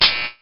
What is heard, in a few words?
Buzz Start; Glow Artisan; DSiWare; nds